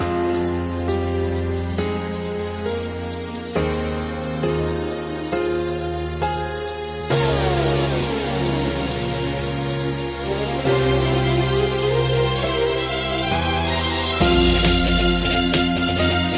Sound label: backing track